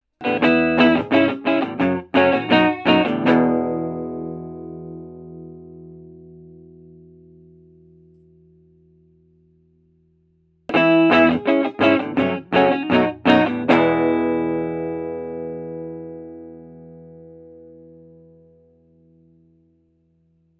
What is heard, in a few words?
chord mix